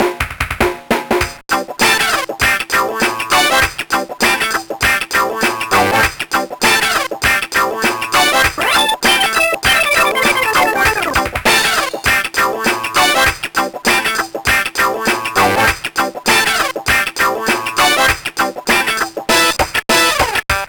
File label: Fugue; Donkey Kong Country; GBA